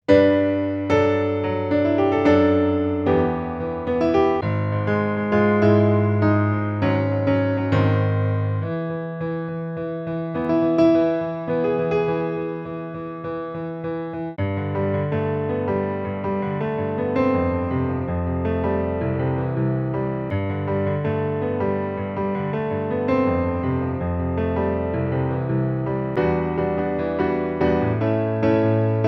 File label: piano midi; by gary pickus by soundtrack loops